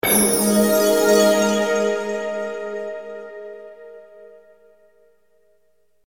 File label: Jingle Menu; Picross